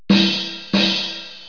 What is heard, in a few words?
DRUM